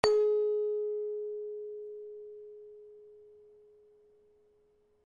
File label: small plate; bellplates